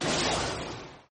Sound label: loud gun shot sound sms tone